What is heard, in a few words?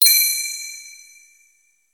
item get